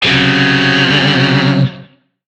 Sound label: Shawzin Hirajoshi Chord D; Music; DE; Warframe; Lizzie Shawzin